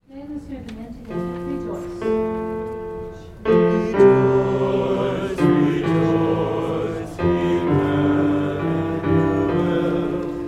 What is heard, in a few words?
bass and tenor